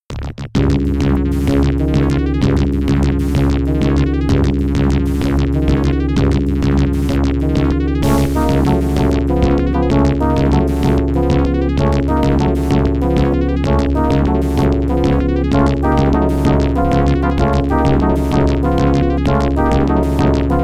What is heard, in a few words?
Drone; Fade to Black; DOS; Alcatraz Drone